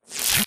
imp bullet energy bubbleshield; Titanfall 2

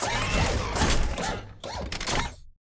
pain knockback right; Titanfall 2

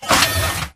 Mvmt Servo Light Medium; AI Stalker; Titanfall 2

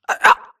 grunt death neck; Titanfall 2

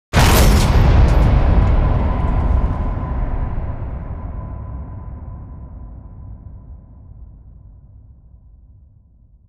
dish hit; Titanfall 2

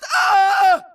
grunt death crush; Titanfall 2

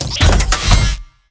Servo Jog; Titanfall 2

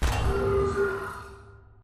Dome Power On Final Light; Titanfall 2; Boomtown

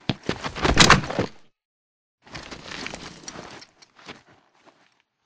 right grunt dive get up; Titanfall 2

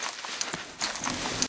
wounded drag; Titanfall 2

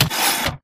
Mvmt Servo Head pivot Short; AI Stalker; Titanfall 2

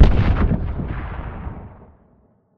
rocket explosion far; Titanfall 2

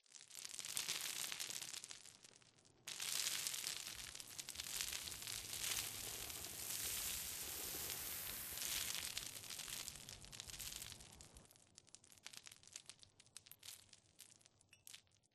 Rock Debris Small Long; Boomtown; Titanfall 2

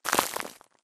bullet light ballistic concrete debris; Titanfall 2

